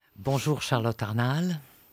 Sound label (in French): ONDE PORTEUSE